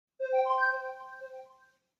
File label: zte notification universe